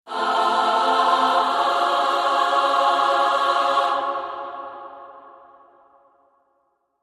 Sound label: F, Choir Only